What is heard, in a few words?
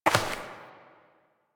Step Dirt; Warframe; Koumei